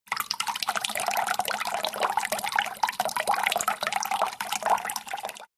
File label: Liquid drips into water